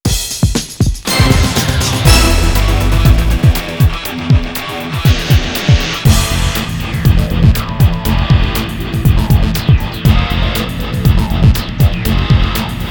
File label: Victory; Spectral vs. Generation